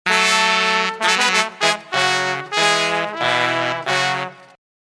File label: Ringtone